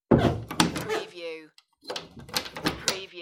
Wooden Door Close And Lock; Sounds of doors and windows opening and closing, keys locking / unlocking doors

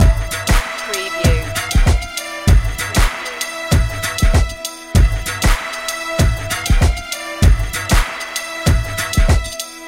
bollywood vibes loop

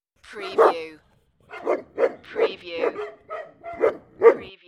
Big Dog Barking

